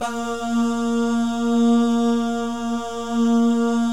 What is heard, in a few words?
BAH GUY, Vocal Planet Jazz & FX; ILIO; BAHS GUYS